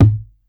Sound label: Foley lo